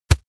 plastic tense bag heavy quick

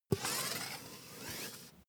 air pump ball pull

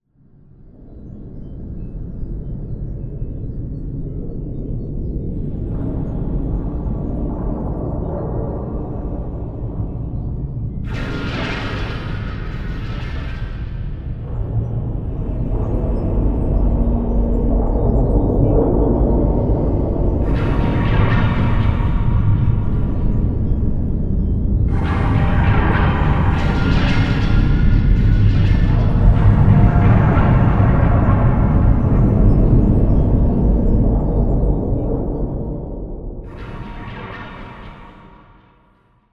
noisy surreal drone

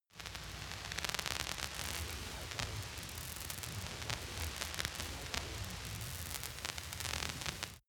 promo pad

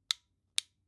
flashlight on slow

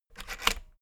rotary control plastic rattle turn back

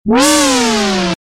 energizing event

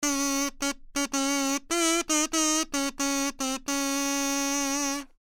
kazoo

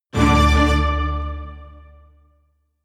jingle hit orchestra

